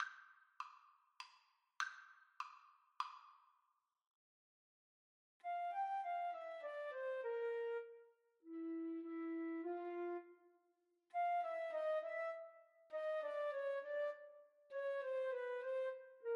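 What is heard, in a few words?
flute duet karaoke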